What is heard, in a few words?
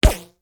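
silencer shot, gun fire with silencer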